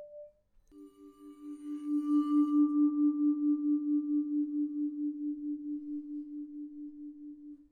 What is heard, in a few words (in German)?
Vibraphon Bow F